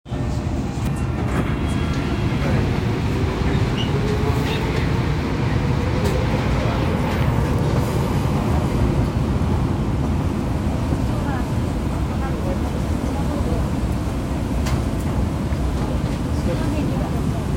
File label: train sounds; 日比谷線日比谷北